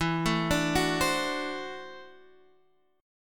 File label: D 7th E, guitar; D7/E arpeggiated; JGuitar